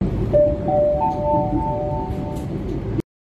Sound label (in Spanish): tram de alicante